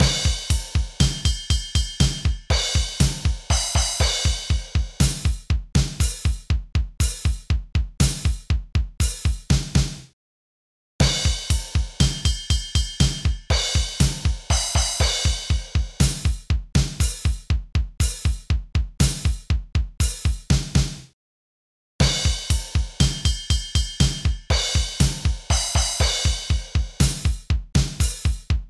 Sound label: dbl bass 60